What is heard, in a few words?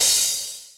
cymbal